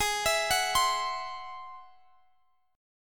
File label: G sharp Augmented 7th G sharp Guitar Standard; x,x,18,21,19,20; G#+7 arpeggiated; capo=2